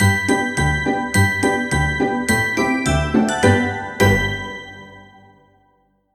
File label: logo